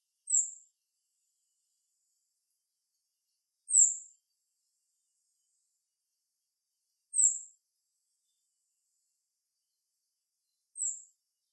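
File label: White's thrush, utsunomiya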